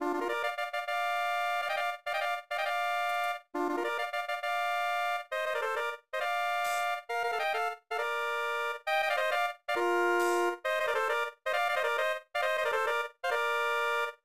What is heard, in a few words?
Congratulations!; Mahjong Gokuu Tenjiku; SNES